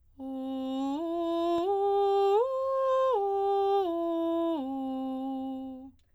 arpeggios straight o, vocalset

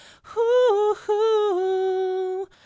Tenor

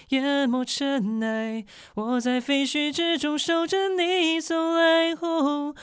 Tenor

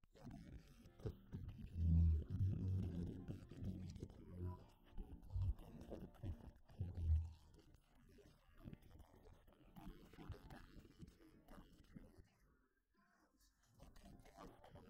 Instruments

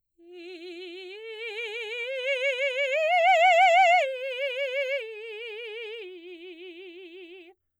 arpeggios f slow forte i, vocalset

